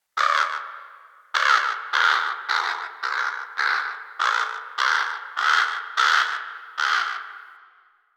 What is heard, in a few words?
Ambient Bird Calls; Warframe; Duviri